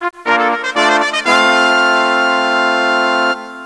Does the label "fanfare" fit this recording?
Yes